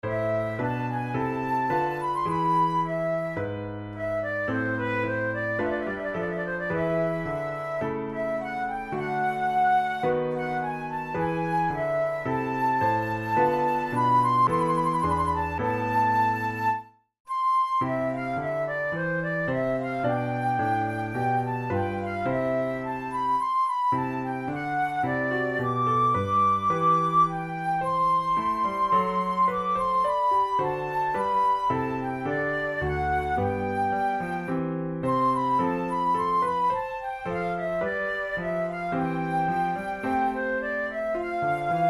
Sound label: sonata in c major adagio, flute tunes; loeillet de gant op3 no1 iii